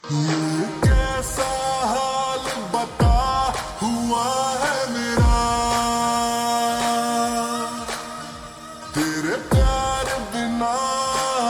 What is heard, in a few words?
Slowed Reverb Ringtone